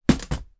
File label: flightcase bash into wall or object; foley